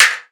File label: armor